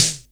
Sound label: TRANCE SNARE; Q Up Arts; Dream Experience